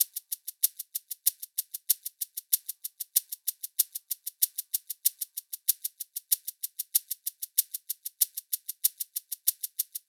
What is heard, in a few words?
095 HipHop Shaker; Percussion; Digidesign; Pro Tools; Pro Tools Essential Loops